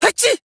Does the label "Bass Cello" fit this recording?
No